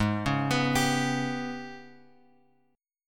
G sharp Minor Major 7th Double Flat 5th; G#mM7bb5 Chord {4 4 X 4 X 3} arpeggiated

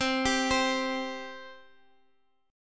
C 5th, C, down, Guitar, Open D

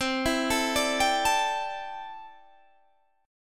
C 6th Add 9th C down Guitar Open D

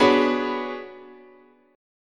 B-Minor 6th Add 9th, chord, guitar

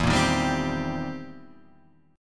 C Minor 7th E; strummed

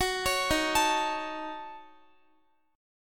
Eb Minor 7th Flat 5th, Guitar; Ebm7b5 arpeggiated

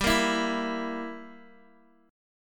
G Minor 6th, Guitar DADGAD

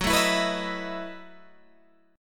Gb Minor 13th Gb down Guitar Open G

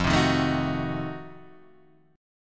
F 7th Flat 9th F down Guitar Half Step Down; F7b9

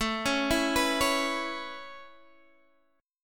A Major Add 9th A, chord; Aadd9 arpeggiated; JGuitar

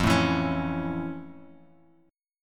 F Augmented Major 7th, Bass Standard; F+M7 strummed; 13,12,14,18; JGuitar

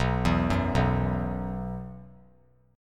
B Suspended 4th; Bsus4 arpeggiated; JGuitar

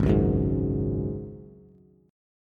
Ab 7th Suspended 4th, Bass, Ab7sus4 chord; strummed

